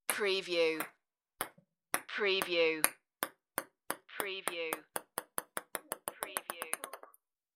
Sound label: Ping Pong Bounce